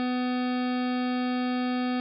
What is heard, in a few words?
Same Fourier Series Except With Phase Randomized; 48 kHz sampling rate